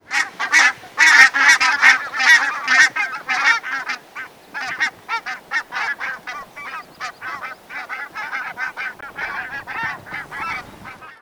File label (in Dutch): Brandgans Branta leucopsis